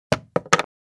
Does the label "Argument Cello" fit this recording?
No